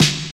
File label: sharp snare drum sound D key, steel snare drum sample tuned to the D note; snare drum samples